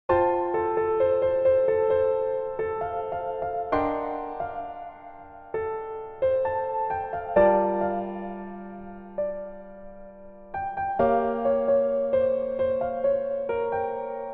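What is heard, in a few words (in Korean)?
Piano version 멜로디MR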